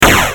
Barrel Explosion; 3DS; Xbox 360; gamerip; doodle jump; android

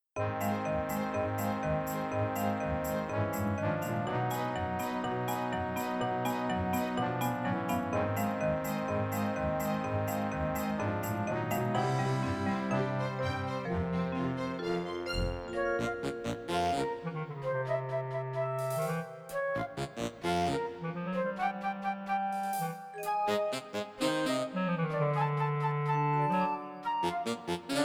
Gameover; day of the tentacle remastered

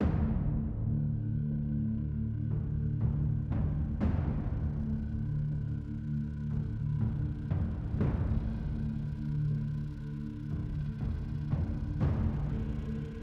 Ambient; Dragon Ball The Breakers; Xbox Series X/S

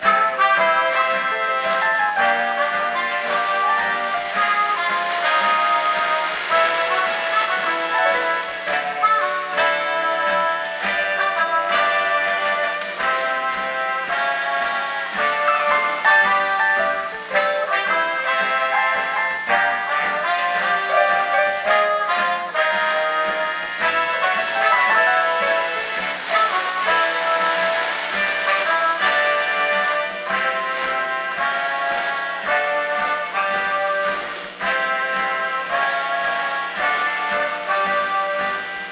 Radio Song; blair witch volume i rustin parr

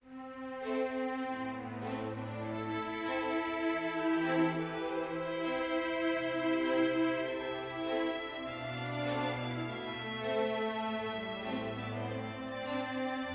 adventure med; Quivr; PS4 Windows gamerip 2016

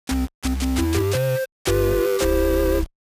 Shift Complete; Five Nights at Freddy's; FNAF; Freddy Fazbear's Pizzeria Simulator

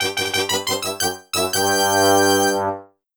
Stadium Fanfare; Grand Dad 64

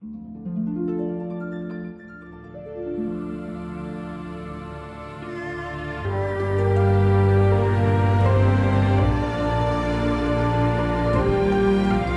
Memory Positive Start

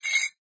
Ting; Ki11er Clutter

